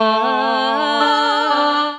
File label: Basic Vocal Racks (Root A); Voice Box; Harmonic Vocal Racks